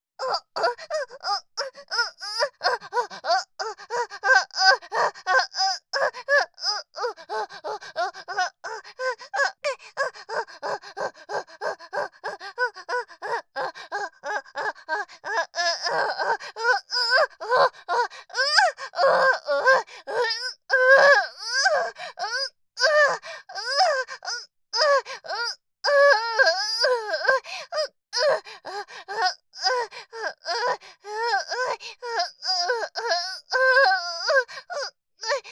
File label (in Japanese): オホ声; SEなし